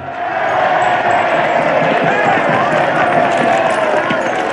audience cheering; people

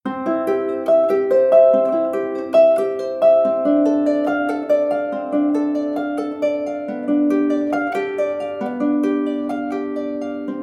ebony harp classical sequence

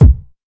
boom bass drum single shot e key

